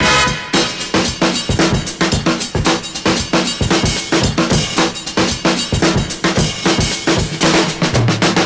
Drums, breakbeats; Head Spins; Ableton; Breakbeats by KutMasta Kurt

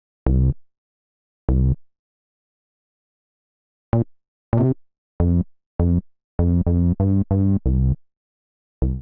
C Minor 85bpm, Bassline; MIDI Clips; Slow Pull Back; Ableton; Factory Packs; Chop and Swing

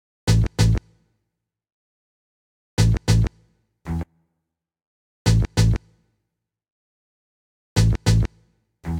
Guit; Ableton; Breakbeats by KutMasta Kurt

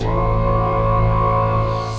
Didgeridoo, Ominous Pads; Linus Didgeridoo; Ableton; Mood Reel

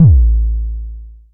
analog pitched, Kickbank; mpk mini presets; Ableton Foundation FreePacks

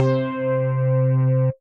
Sticky Lead, Synth Lead; Instruments; Ableton; Synth Essentials; Operator

